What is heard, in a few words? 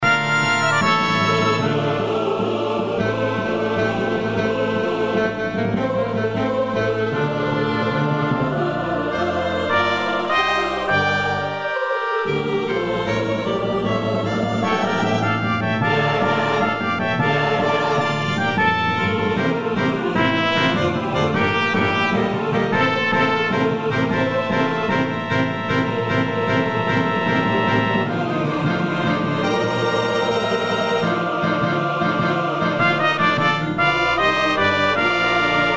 Synth